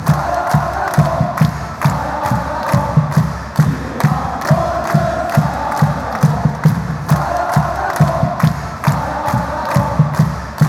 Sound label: chant